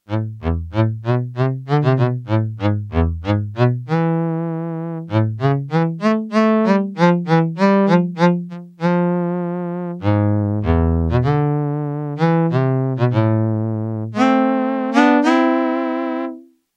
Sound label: Ele Cello B, Yamaha TX802; Factory Patches; Voices